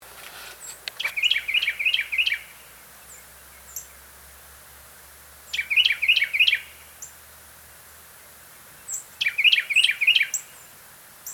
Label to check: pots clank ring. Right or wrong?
Wrong